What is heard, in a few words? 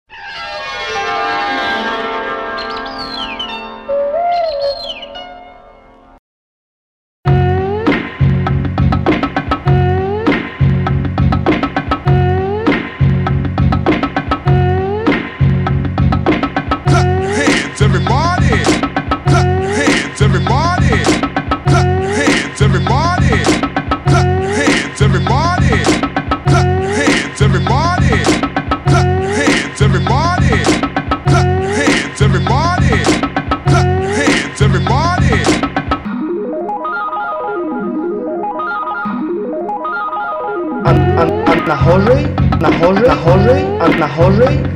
jazz; Wstep Na Hozej